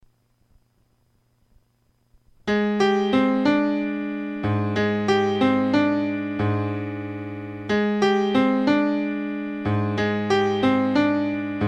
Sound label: Duet for Flugelhorn Piano in Ab; Juan Garcia Music